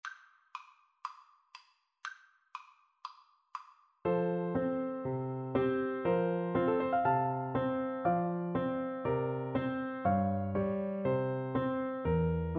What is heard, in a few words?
reed pipes, Tenor Saxophone